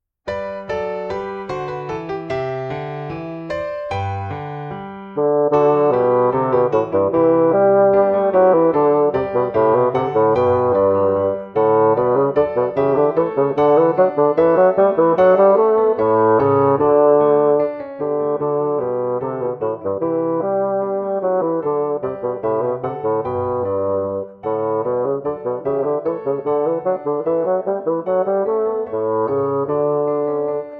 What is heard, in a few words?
Woodwind Bourree